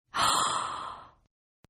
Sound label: Gasp Female; Gasping; Voice